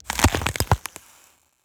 ice crack break; snow; Bluezone Corporation

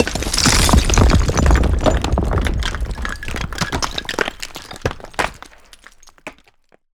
building collapse debris falling rock loud; Bluezone Corporation

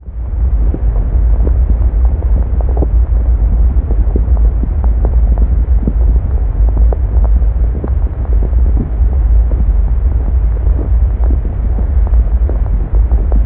biohazard atmos background cave organic deep; Bluezone Corporation

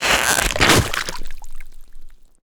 creature attack whoosh impact water; Bluezone Corporation; Organic

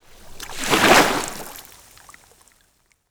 isolated lake wave wide; water; Bluezone Corporation

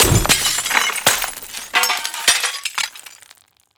building collapse debris falling rock glass; Bluezone Corporation

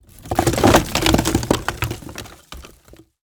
texture wood; mountain dweller; Bluezone Corporation

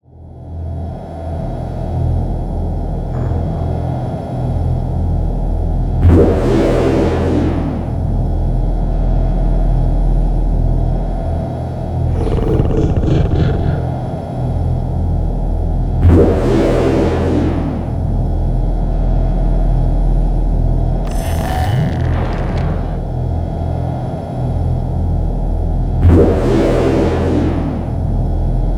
background command center ambience; science fiction; Bluezone Corporation

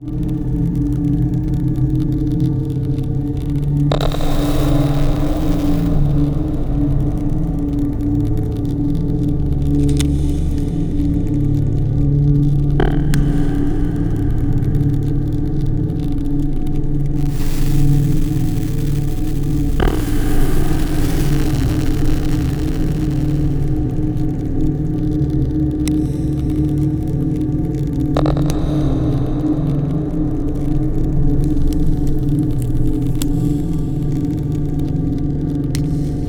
black project cinematic ambience; scary; Bluezone Corporation

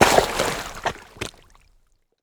mountain dweller texture mud splash; water; Bluezone Corporation

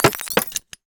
steampunk mechanical mechanism metal; Bluezone Corporation

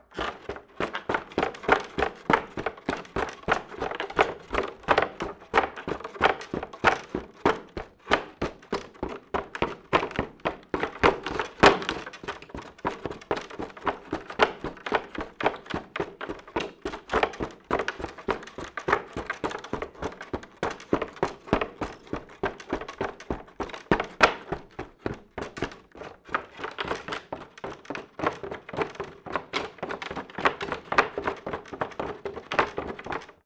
Creaky Wood Boots Walking, Footsteps; Todd Blankenship

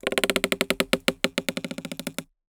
wood creaking tree; Bluezone Corporation

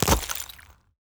ice crack break shard; snow; Bluezone Corporation

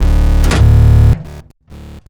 annihilation mech mechanical texture sequence; robot; Bluezone Corporation

